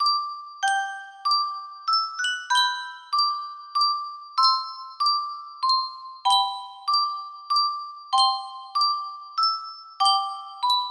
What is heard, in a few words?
Yunsheng Custom Tune Spieluhr, music box melody